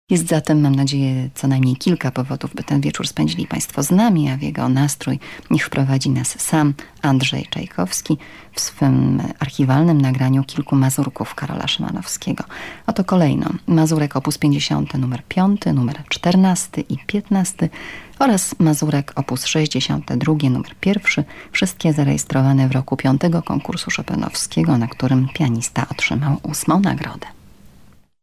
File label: szymanowski mazurka, pianist